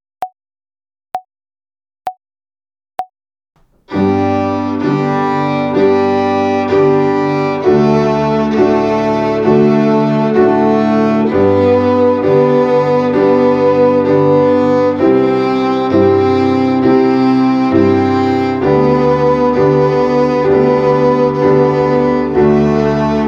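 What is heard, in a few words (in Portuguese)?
Ginástica até o dedo 3 - Corda Sol - Quaternária, Violino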